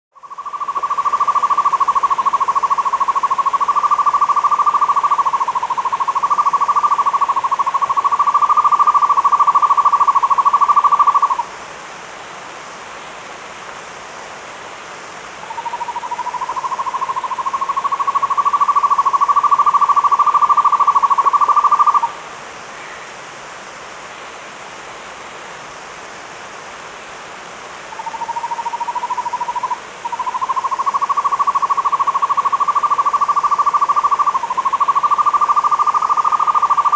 Buff-Fronted Owl, Aegolius Harrisii, Nature's Songs, S. America